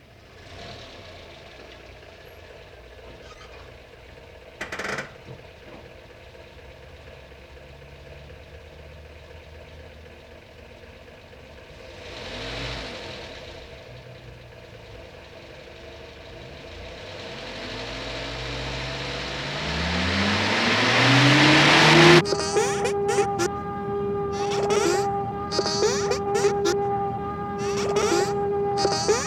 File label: car rev to drone